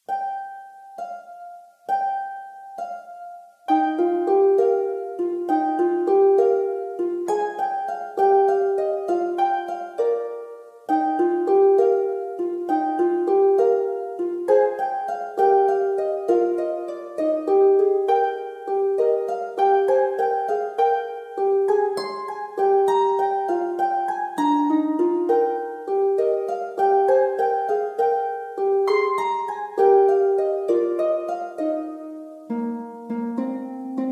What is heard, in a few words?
Harp; Little Harp Pieces; Fawn Leaps; original compositions